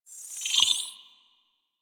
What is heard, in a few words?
Hub Railjack Hologram Start; Tenno; Warframe; Ambience